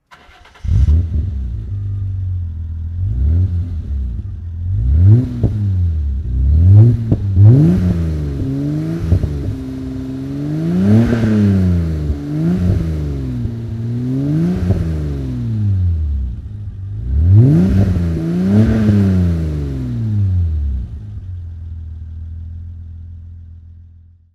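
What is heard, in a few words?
bmw z1 throttle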